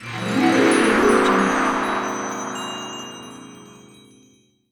magic wind

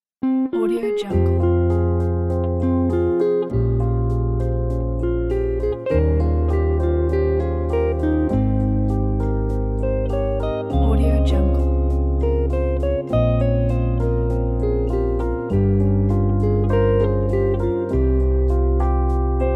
romantic night

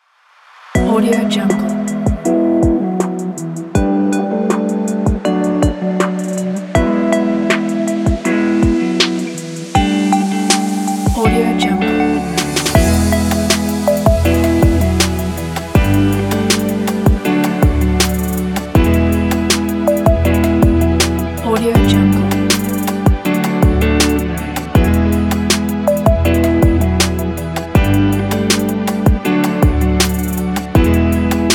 documentary

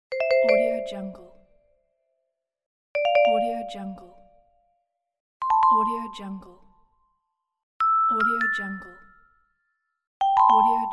vibraphone massage

